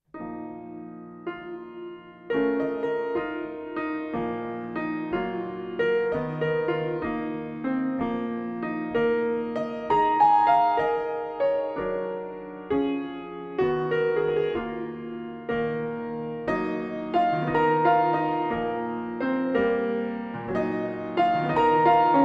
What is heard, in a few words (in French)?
piano ivre